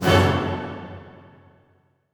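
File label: orchestra; Masterbits - Switch FX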